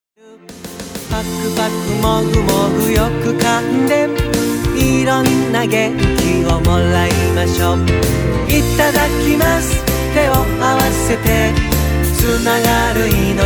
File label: solo